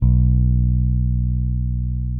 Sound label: Whole Notes B, Bass; Bass X